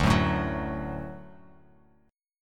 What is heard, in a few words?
D Major D down Bass Standard; D strummed; JGuitar